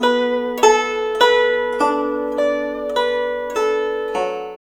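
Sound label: guzheng; Spectrasonics; Heart of Asia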